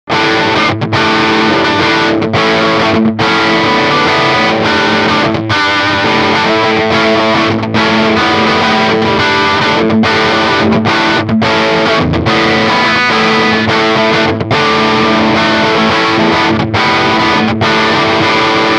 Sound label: Crunch Dry